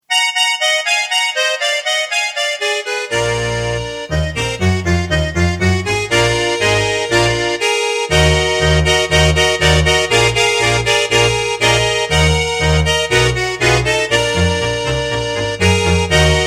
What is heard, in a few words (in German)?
Zwei Gitarren